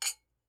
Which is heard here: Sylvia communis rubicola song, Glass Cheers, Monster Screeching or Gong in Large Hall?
Glass Cheers